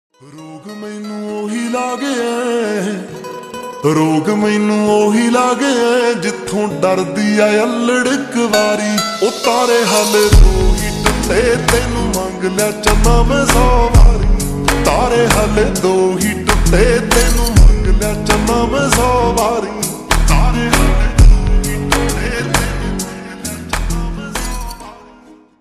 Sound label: Slowed Reverb Ringtone